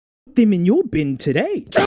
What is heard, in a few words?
ahh; dankpods